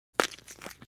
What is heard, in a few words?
Bone Break; Fights Bodyfalls